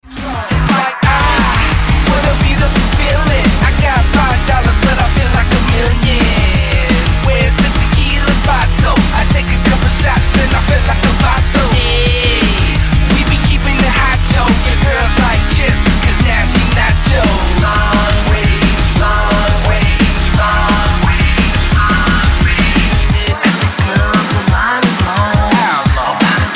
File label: sine